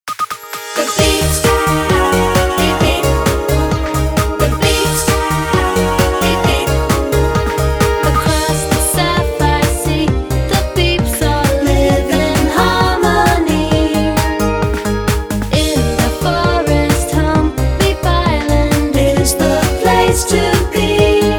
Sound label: Beeps Titles